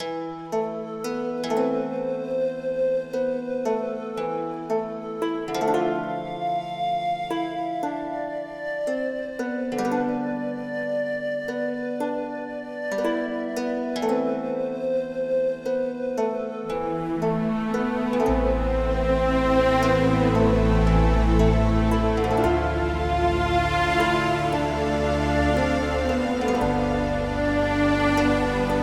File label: Medieval Theme Looped; Dual Blade: Battle of the Female Ninja